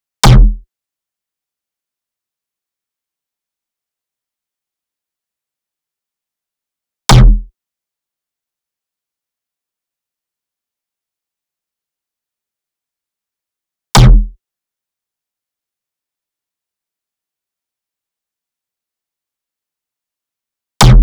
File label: 140 BPM F# Min Donk, Speed House; Cymatics; GHOST (Beta Pack); Annihilate